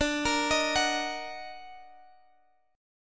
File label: Eb Minor Major 7th D